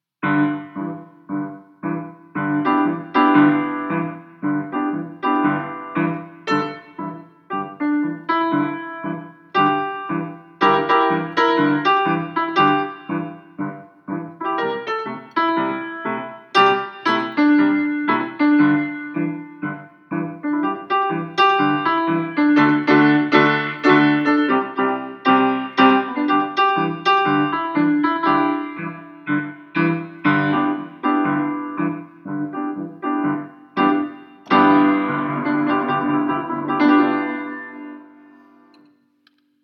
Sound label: piano